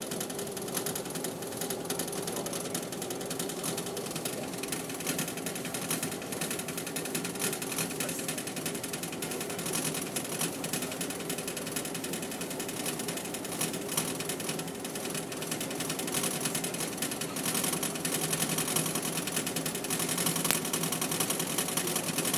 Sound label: Ferry Rattle